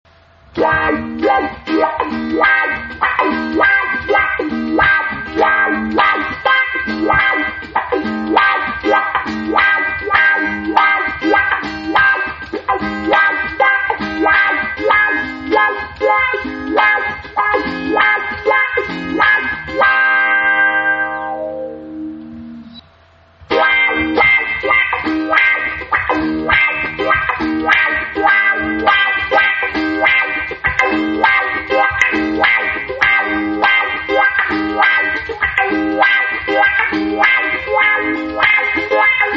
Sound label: colorsound wah